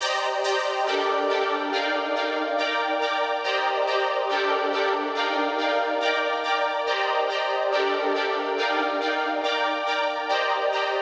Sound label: 140 BPM G Min Chords; Cymatics; Destiny Gold Expansion; Infinity